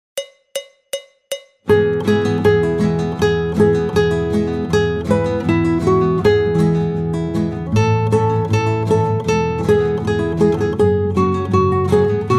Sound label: jingle bells; Weihnachtsliederbuch